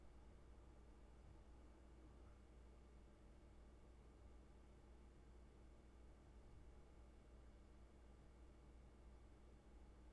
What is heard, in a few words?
room tone